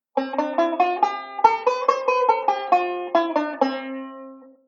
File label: Delay Scale, banjo; Beyond Chord Melody Volume II